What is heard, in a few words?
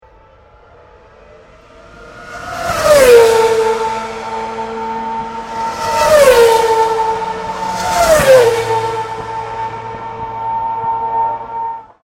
CAR RACING F1 X3 PASSING, F1 Grand Prix practise